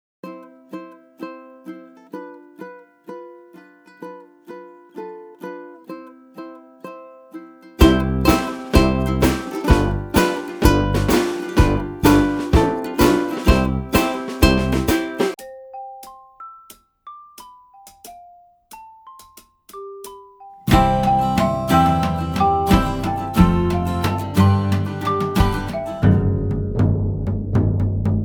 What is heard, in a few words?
shake rattle